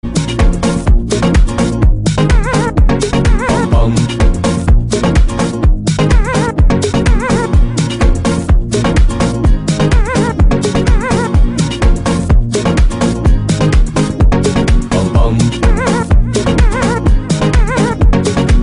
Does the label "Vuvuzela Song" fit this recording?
Yes